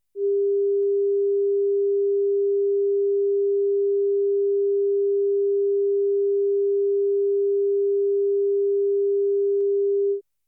讯号测试 400Hz

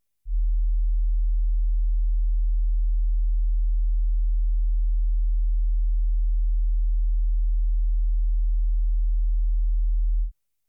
讯号测试 40Hz

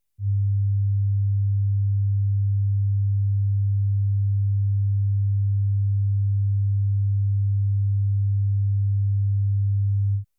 讯号测试 100Hz

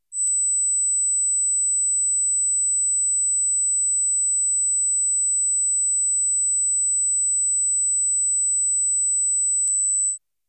讯号测试 8KHz

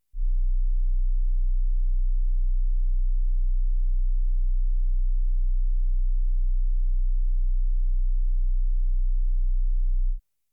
讯号测试 31Hz

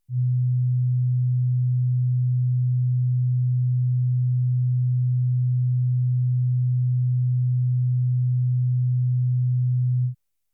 讯号测试 125Hz

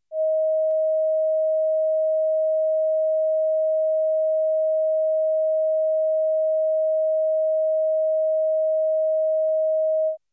讯号测试 630Hz

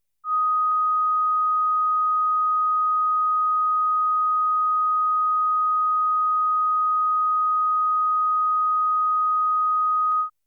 讯号测试 1.25KHz

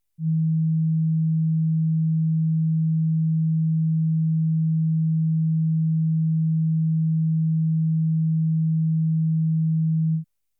讯号测试 160Hz

